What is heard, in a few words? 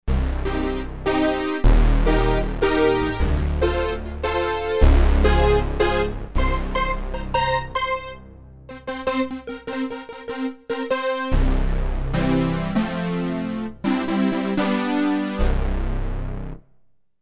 Big PWM